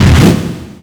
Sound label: Flame Choke Rush; Ganondorf